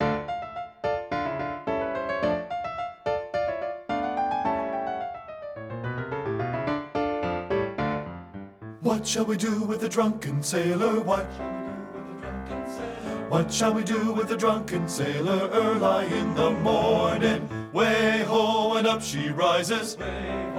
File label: BASS; men